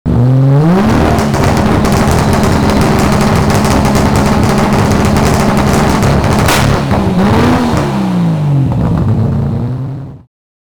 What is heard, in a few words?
Skyline GTR revving; Fastcar Magazine; RK Tuning